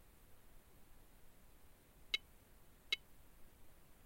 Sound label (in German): TON MINI kurz